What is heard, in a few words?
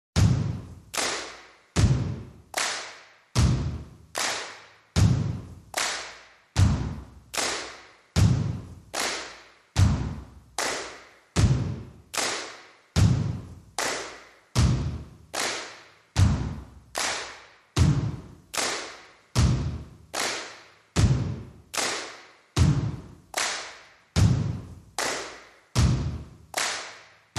Extra Stomps and Claps Only